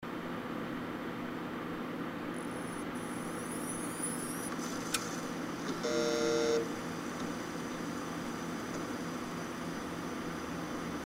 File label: start-up